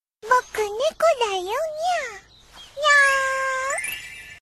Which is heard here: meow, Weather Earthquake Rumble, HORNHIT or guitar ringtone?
meow